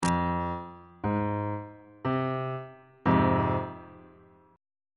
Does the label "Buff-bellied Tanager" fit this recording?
No